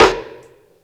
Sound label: RIMSHOT, Ragga & Dub Reggae; USB Soundscan vol.27; AKAI